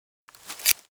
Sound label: Cut Thorn